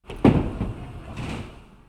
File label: industrial machine robot; arm